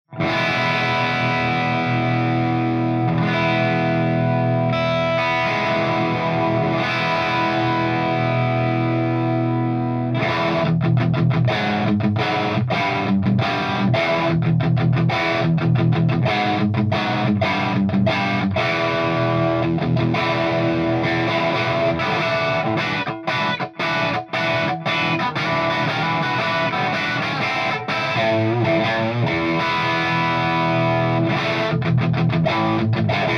JCM BLEND

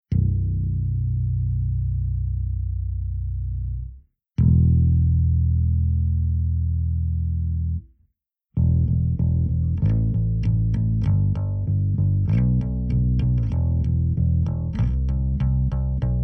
SVT LOW HIGH

